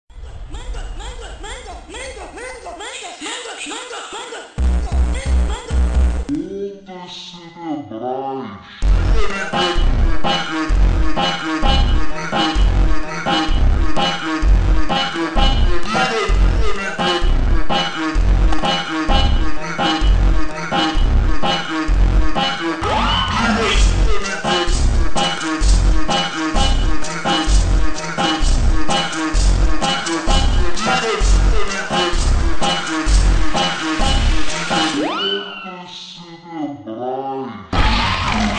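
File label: MONTAGEM FUNK slowed reverb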